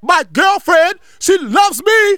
Choeurs & effets; L'Effet Pommier - Volume 04

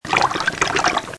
blup

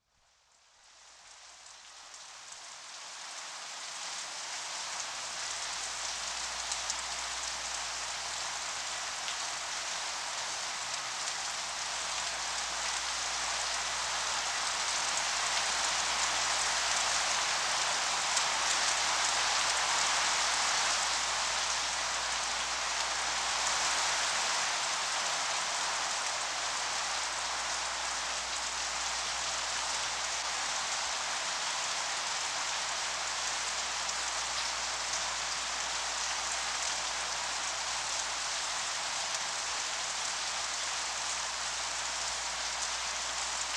pluie; MOBICLIC 125

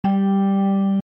musique

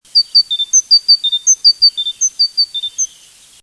CUICUI; MOBICLIC 84; TOUTEDIT

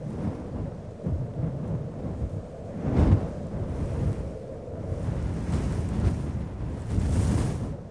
vent

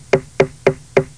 bruit

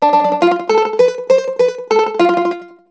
banjo; MOBICLIC

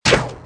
FLECHES TIR; MOBICLIC145

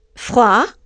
FROID

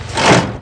usine; MOBICLIC

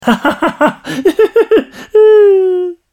RIRE

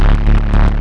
COFFRE; SALON

